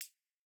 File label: Jrock hihat